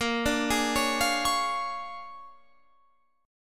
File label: Bb 7th Sharp 9th Bb, Guitar Open F; Bb7#9 arpeggiated; 22,21,20,20,20,20